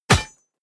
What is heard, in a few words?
solid short medium, hits impacts